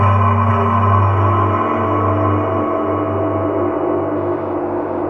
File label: KORG WAVESTATION; Rubber Chicken Software Sample Bank